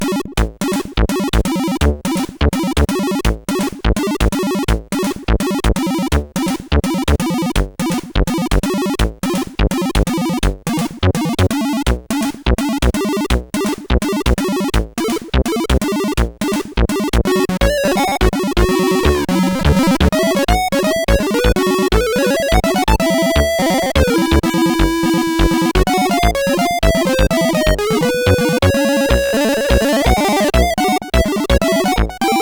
Blues, CSG8580R5; Spike Super Blues Experience

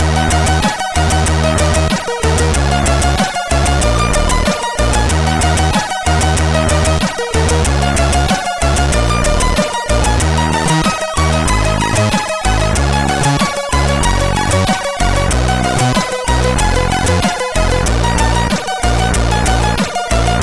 intro, CSG8580R5; Snake or Die

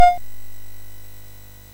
Dragons Den, MOS6581R3

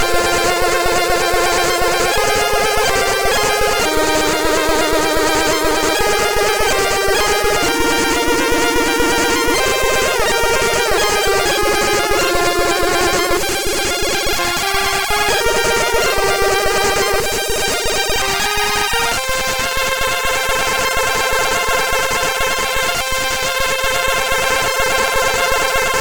High Trance, MOS6581R3

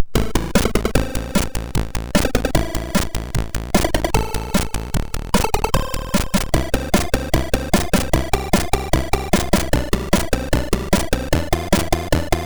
sid, MOS6581R2

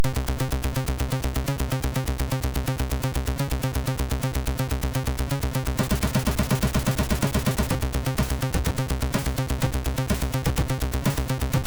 Techno Bells, MOS6581R3 sid

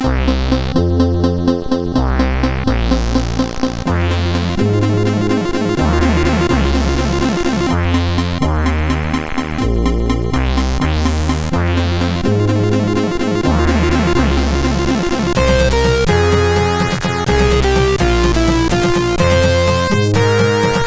Queasy, sid CSG8580R5

